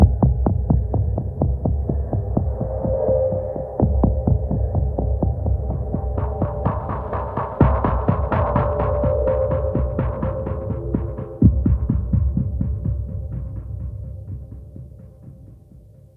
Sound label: Sneak